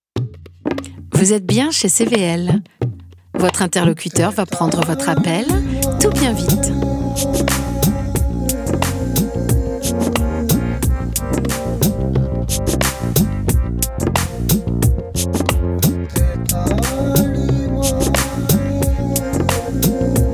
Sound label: Indie Air